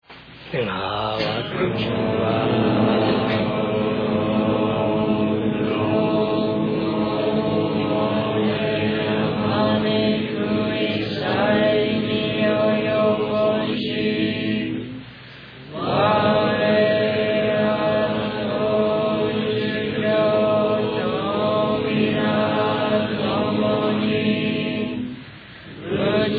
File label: end chant